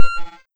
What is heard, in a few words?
Synth; pitchglitch samples; SynthieV1